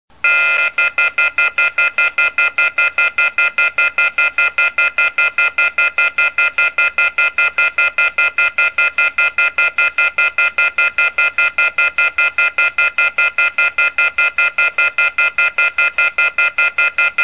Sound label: offhook